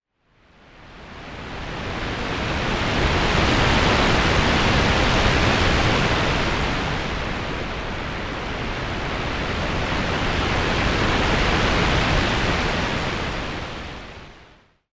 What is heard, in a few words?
Doppler Shifting Noise